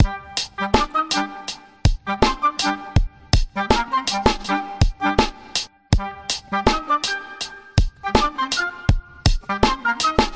Hip Hop n Flute Riff

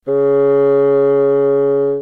Bassooon Note

